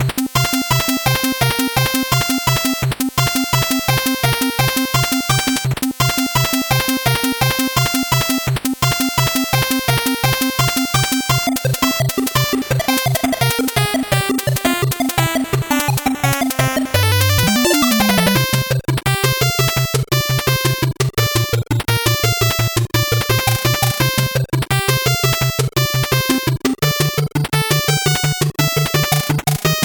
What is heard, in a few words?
8bit bgm normal